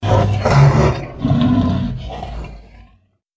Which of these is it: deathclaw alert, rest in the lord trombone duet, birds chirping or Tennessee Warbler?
deathclaw alert